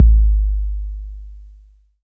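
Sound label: bass drum 808 Tape Decay E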